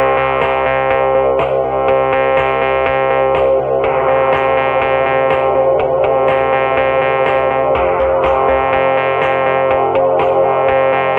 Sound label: buzzed in, loops electronic